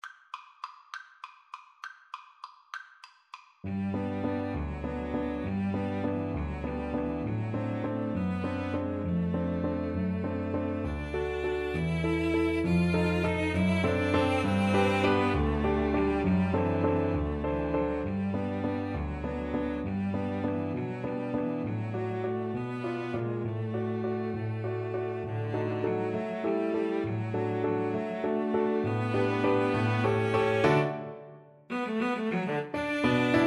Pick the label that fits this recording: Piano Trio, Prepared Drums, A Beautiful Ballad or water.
Piano Trio